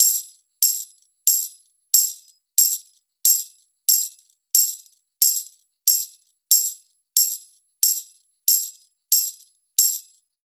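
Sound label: percussion, Roots Funk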